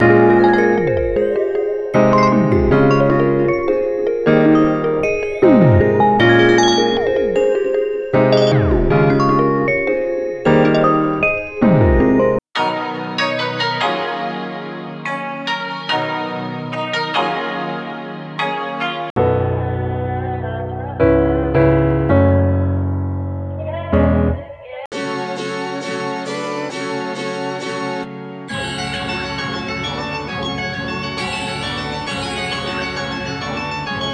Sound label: melody loop piano